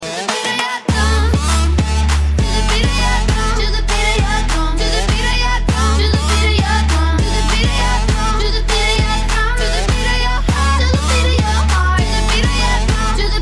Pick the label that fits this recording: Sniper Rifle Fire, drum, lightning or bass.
drum